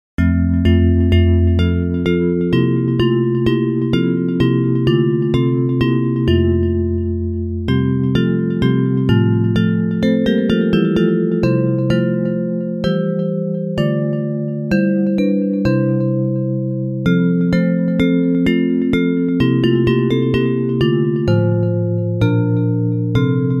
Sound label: Bells